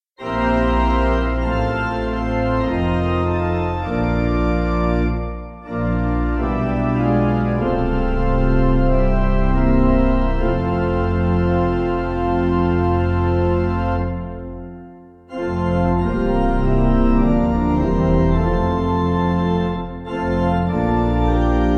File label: Pipe